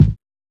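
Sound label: bass drum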